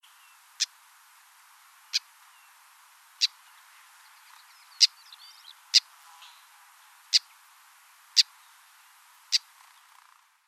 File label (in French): fauvette sarde